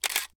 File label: camera click burst; Meizu MX4